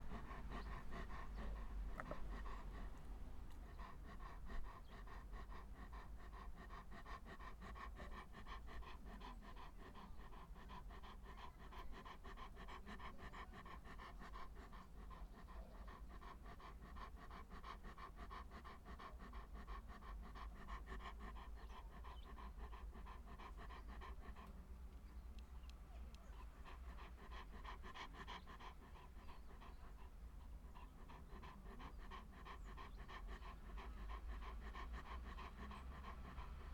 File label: Dog Panting